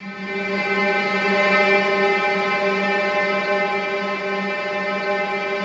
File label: SLOW STRINGS